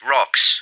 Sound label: rocks